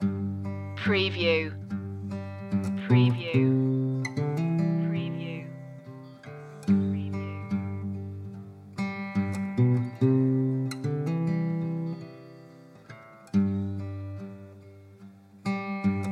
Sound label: delta mercy loop